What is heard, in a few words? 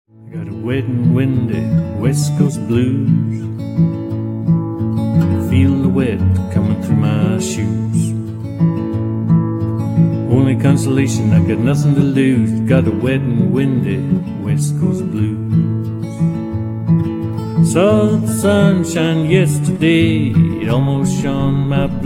Wet and Windy Blues